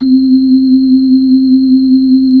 B-3, Hollow

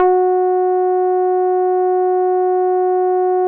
F#5, Bass; E-MU Producer Series Vol. 6 – Analogia Project (CD 1); Boom da Bass 2

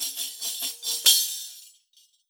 tamb, percussion

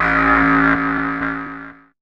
DR. LOOP, TRANCE; X-Static Goldmine 3

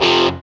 GTR Crunch, Dirty; Club-50 - Foundations Roland

